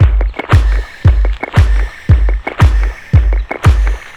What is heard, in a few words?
Drums Full Kit 115bpm, Dark Techno; Touch Loops